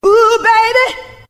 "ooh baby" SUNG; ooh ahh

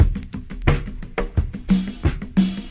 tribal urge, Drum loops

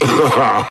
laugh evil

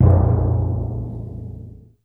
brum, Misc Percussion Tympany

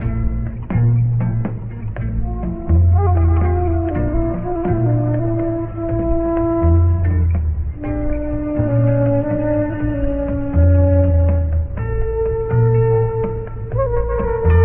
flute and guitar, Loops

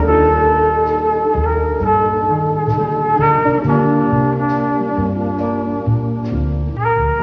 horns, jazz loops

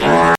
fart short

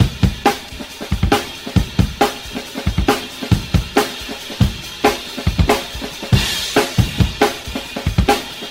fake break, funky drum loops

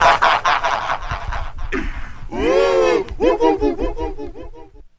laugh woo woo woo woo EVIL; ooh ahh

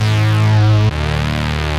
hornets; Loops; Electronic

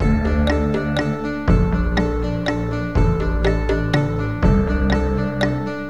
keys, Sad Loops

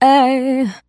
"ay" SUNG; Voice; ooh ahh

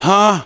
huh, Voice

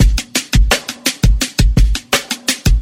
Drum loops, Boombap

